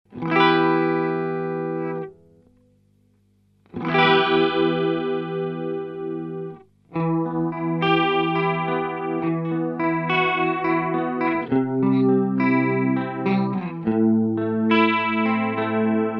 Echo Shifter ES3 Analog Chorus; Ibanez

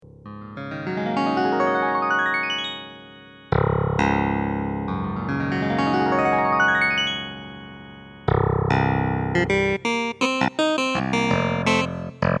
Clavinet

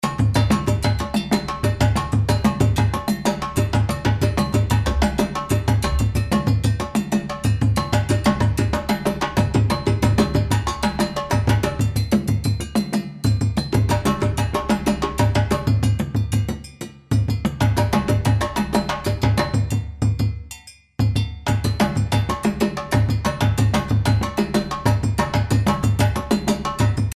West Africa Ensemble Kunding

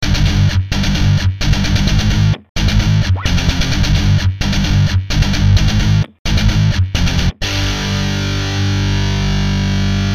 Electric

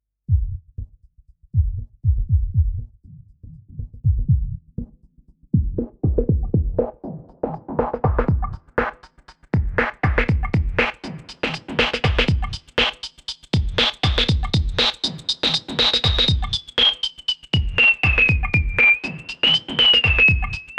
Superego plus Filter Drumloop

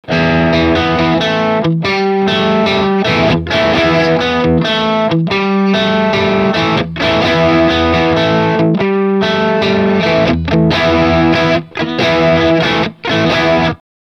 Brit; Boss IR-2

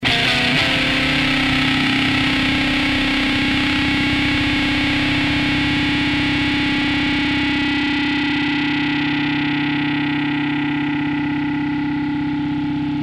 Sustain dist lead 2x12

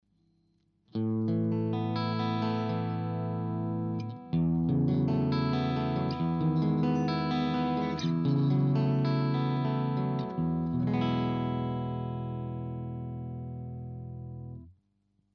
clean Position SM57

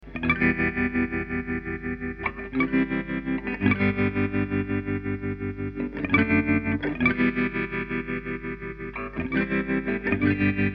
clean gain 12h tremolo

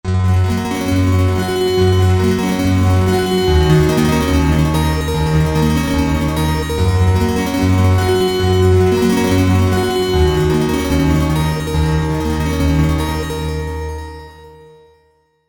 Guitar Mix

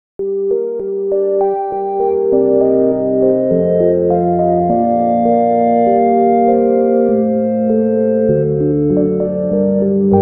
Celestial Organ

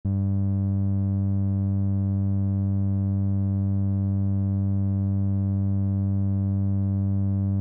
King Drone G Osc Clean

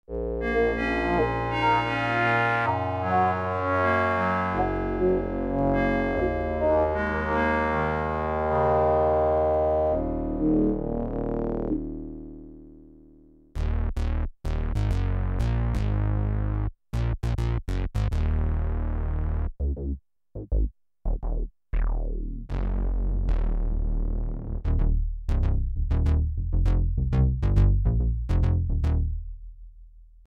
xpander

